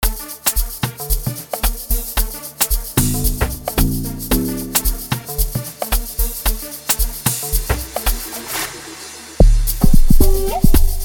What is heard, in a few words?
Amapiano